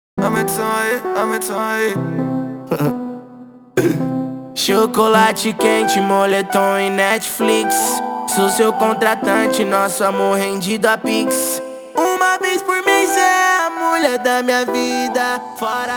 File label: funk